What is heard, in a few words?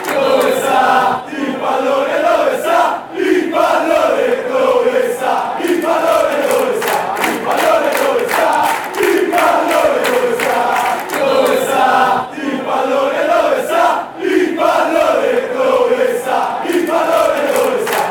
lazio chant